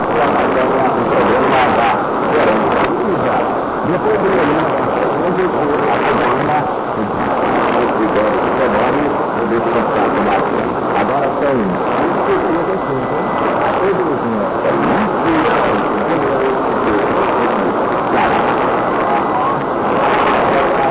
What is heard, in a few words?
3375kHz; R. Educadora